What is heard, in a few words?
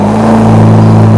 gauntlet rev down; gta5